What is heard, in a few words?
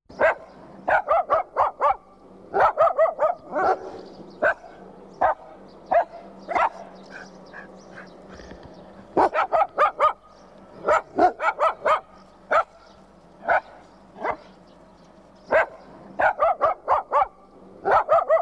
Dogs; SOUND EFFECTS1